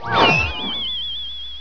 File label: r tele